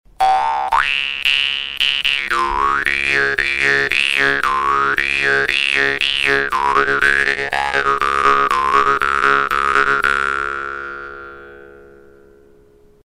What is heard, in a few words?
Tuned Jews Harps C2; tuned jaw harps from austria